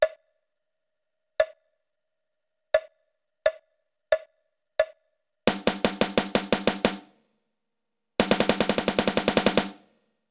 For Drums Only

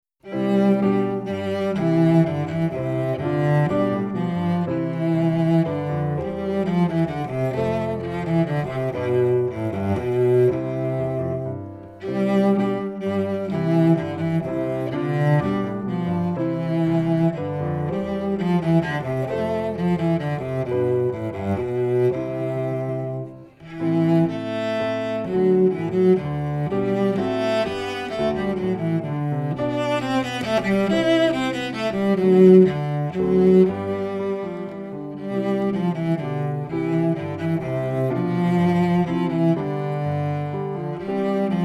Minuet, Cello; Suzuki Cello Vol 2

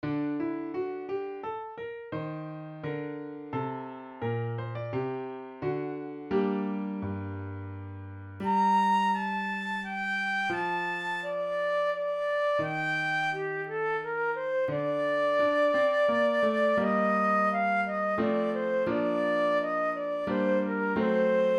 Flute Solo, Minuet Flute; Minuet Acc.; Standards of Excellence Solos

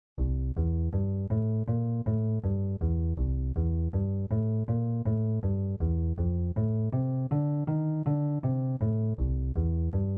Bass Guitar; Boogie

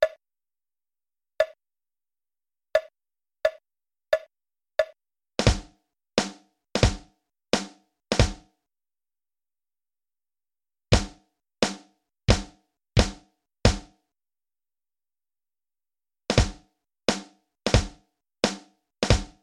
Warm-Up, drums

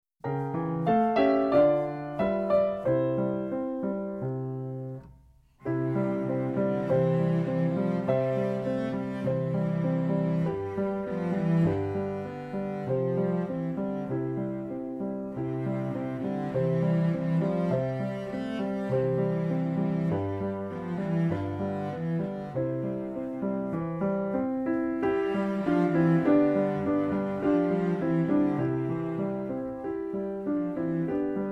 Piano, cello; Suzuki Cello Vol 2; Long Long Ago

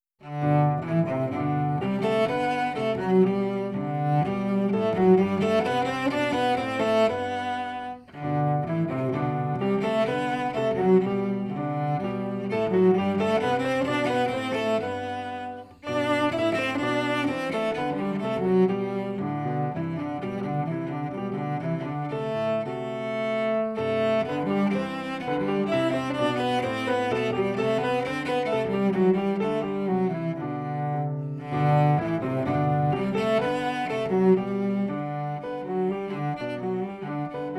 Musette, cello; Suzuki Cello Vol 2; From English Suite No 3

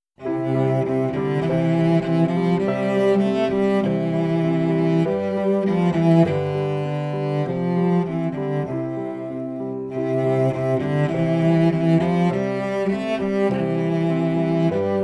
Long Long Ago, Cello; Suzuki Cello Vol 2

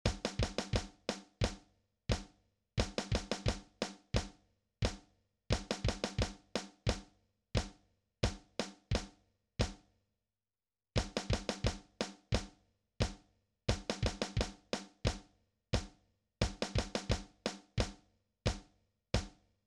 Warm-Up, drums

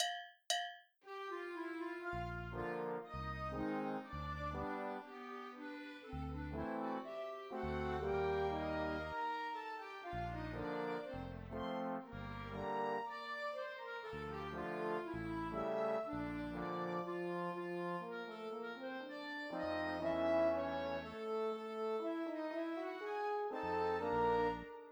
minus Alto Sax Classical

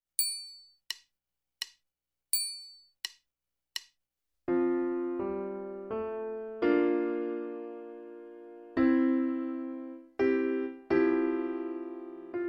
Morning Song - Horn

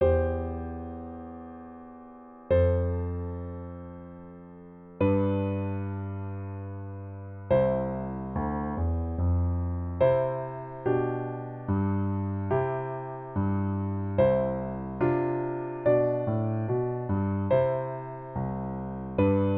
Minuet No. 1, cello; Suzuki Cello Vol 2

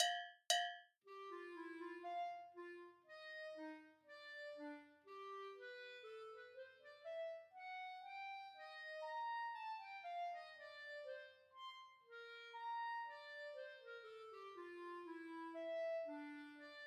Clarinet Classical

